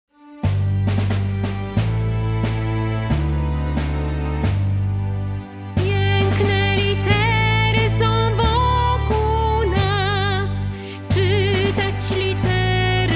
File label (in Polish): wersja wokalno instrumentalna